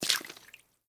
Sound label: step Water